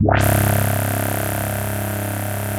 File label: ARP REZO C2, REZO BASS; USB Soundscan vol.12; AKAI